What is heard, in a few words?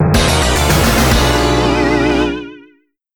Player Miss; Castlevania: The Adventure ReBirth; Wii